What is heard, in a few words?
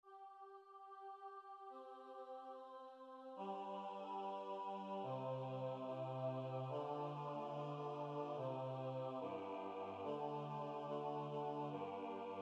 Agnus Bass; Agnus Dei